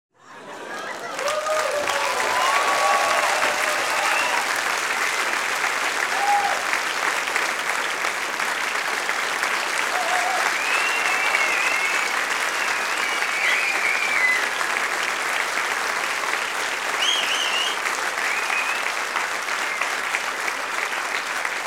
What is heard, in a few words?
laugh applause